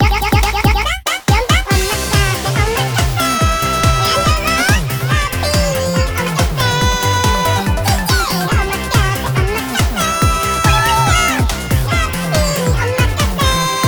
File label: BGM